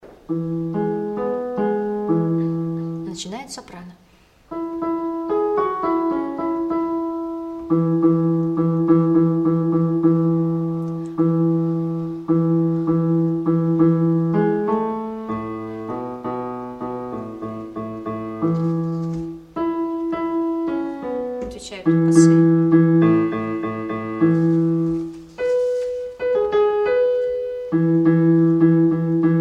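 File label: Bass instrumental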